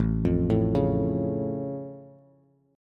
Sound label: A Augmented A, Bass; chord